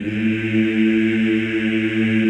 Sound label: CHO A1, Eeh; Eeh + Ehh